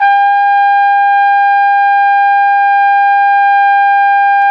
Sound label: Flugelhorn, Solo Brass